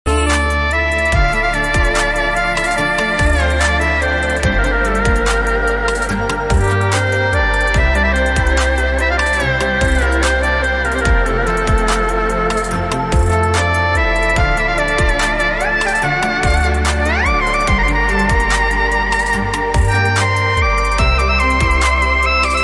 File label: background music